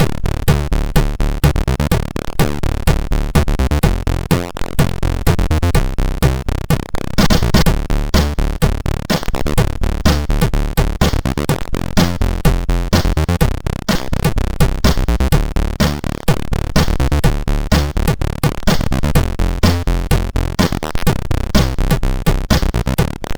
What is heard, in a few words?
sid MOS6581R2